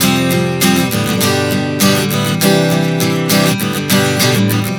Fadd9-G-Cadd9 100 bpm, acoustic guitar chord progressions